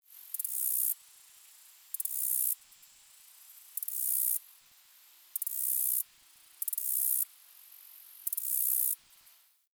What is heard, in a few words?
Tree Cricket; Ambience; Warframe; Nineteen Ninety Nine